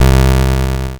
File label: NOTE bass C2, LSDJ; LIMAT LSDJ kit; LSDJ Notes; Drums